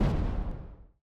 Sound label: explosion; Mindustry